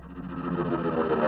Notificat

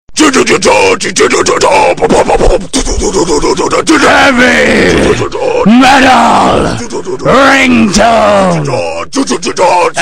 Heavy Metal Ringtone

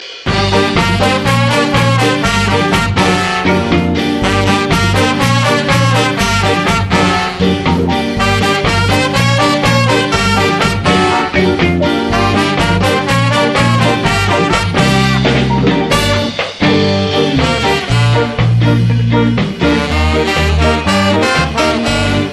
Flute

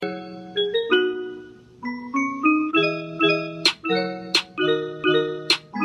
Trap Remix; Nintendo

